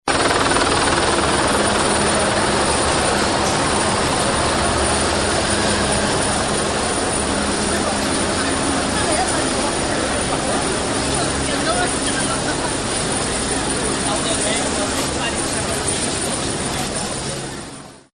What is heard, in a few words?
Chopper